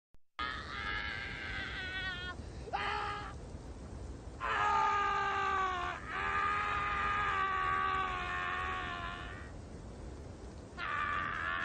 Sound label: Wounded Screams; Rust